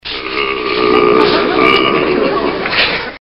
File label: groan